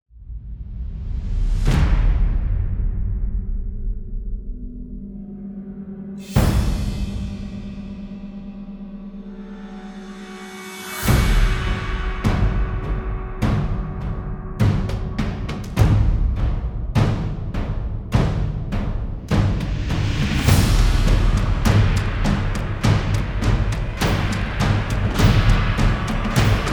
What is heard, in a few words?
Taiko, Percussion; Relentless Percussion; Gothic Storm Music